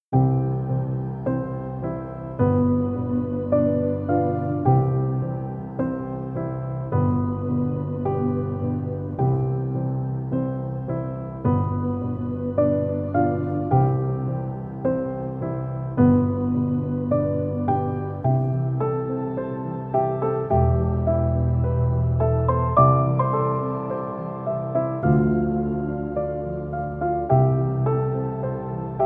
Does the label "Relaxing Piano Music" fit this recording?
Yes